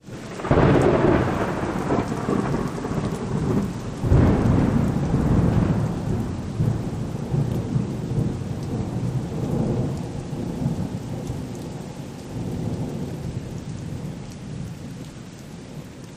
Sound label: Lightning Bolt Thunder Crack; Storyblocks Audio